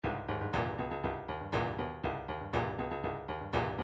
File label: midi drums drums